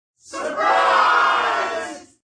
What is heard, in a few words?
Surprise Group, Exclamation; VoiceBox